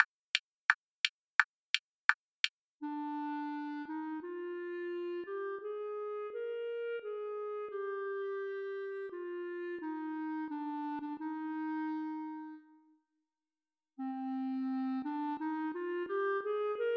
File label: clarinet slow